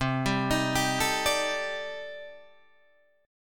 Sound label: C Minor 6th Add 9th, C, Guitar, Drop D; down; chord shape